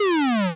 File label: fall, square waveform; Square Waveform sfx